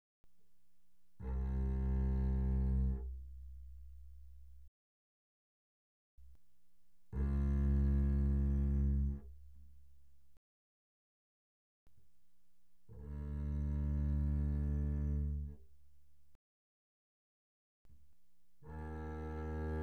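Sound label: Bass arco mezzo forte sul A C2 B2, doublebass; Strings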